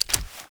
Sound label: knife stilleto, stilletto flip; arccw go